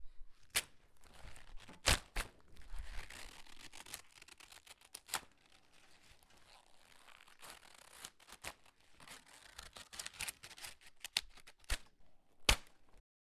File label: Elastic